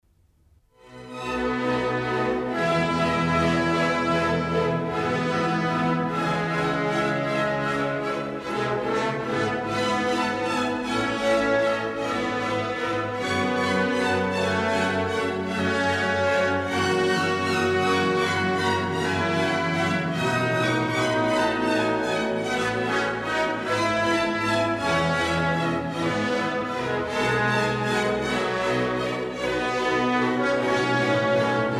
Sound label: trombone; strumenti